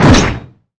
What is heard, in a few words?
spear attack, weapons